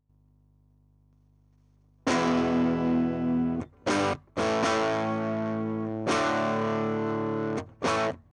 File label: hum